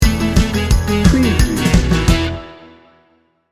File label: happy face sting bumper